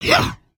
VO Walk Run; creatures organic

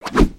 Seagull Swoop; creatures organic

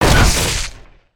Spider Boss On Hit; creatures mechanical

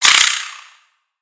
Spider Get Hit

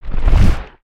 Dragon Wing Flap; creatures organic

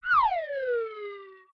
Slide Down, creatures organic; Burno

